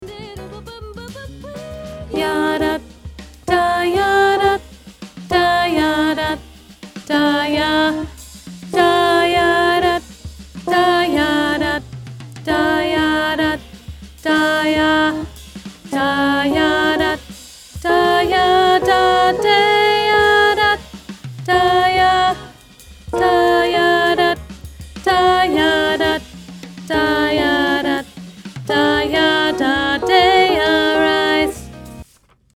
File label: alto, vocal jazz honor choir; SCVA Vocal Jazz Contemporary A Cappella Honor Choir